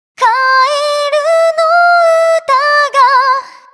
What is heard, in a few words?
mellow